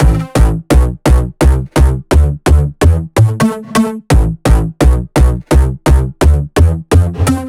128BPM Melody; Vengeance Samples; VFH1 128BPM Meatball Kit

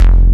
Synth Bass; Vengeance Samples; VEE Synth Shots

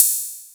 Cymbals Ride; Vengeance Samples

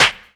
Claps; Vengeance Samples

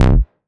Bass C, Offbeat Bass

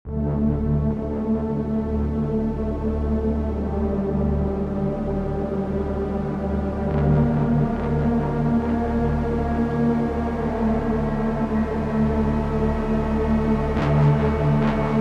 Complete Mix 140BPM, D minor; Vengeance Samples

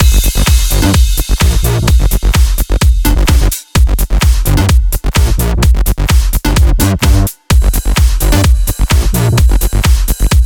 128BPM Mix; Vengeance Samples; Uno Kit

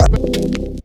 Synth Shots; Vengeance Samples

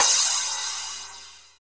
Crash; Vengeance Samples; VES1 Cymbals

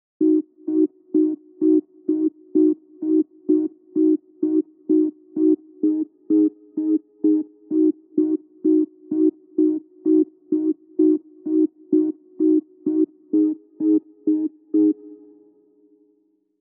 128BPM Organ Root D#; Vengeance Samples; Basket Kit

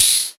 Cymbals HH Open; Vengeance Samples

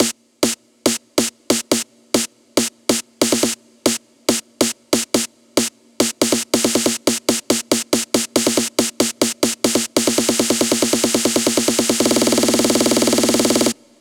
140BPM SNARE 8Bars; Vengeance Samples; Snares&FX Pairs

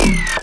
Minimal Perc; Minimal Percussion

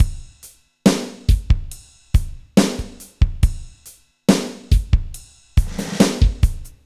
Slomo Beat 140 BPM, Loops, Slow Motion Beats; Vengeance Samples

